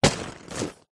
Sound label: office pencil case full drop